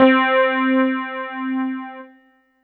Yamaha EX5 Jazz Chorus C5

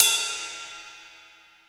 Ride Cymbal

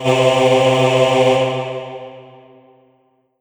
Korg 01W Choir C3

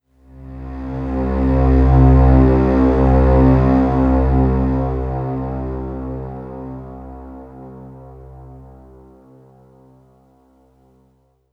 E-Mu Proteus FX XtraSlow C2

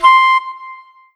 Kawai K5000W Flute C6

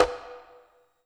Mute Conga

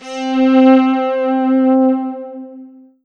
Roland SC-88 Metallic Pad C4

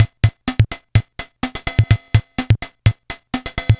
Yamaha PSS-140 16 Beat

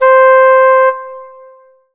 Roland SC-88 English Horn C5

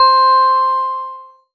Ensoniq ESQ-1 Toy Piano C5

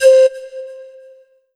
Yamaha TG500 WN Pan C5

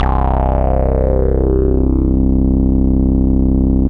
Roland PN-D10-02 Resonance Bass C1; Bass - Synth

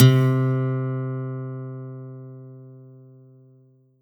Roland SC-88 Steel String Guitar C3

